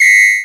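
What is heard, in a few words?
Boss DR-202 percussion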